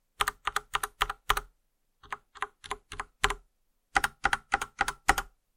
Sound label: weiss multikeys